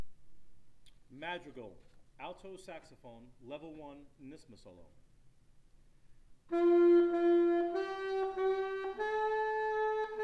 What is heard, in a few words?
Madrigal alto sax solo; nyssma